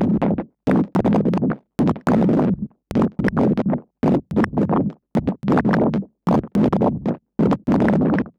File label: Alien Chatter, sci-fi